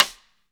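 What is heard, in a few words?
snare drum rim; Best Service Gigapack 2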